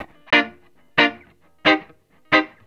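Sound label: reggae electric guitar #A; Best Service Advanced Media Trax Modern Composer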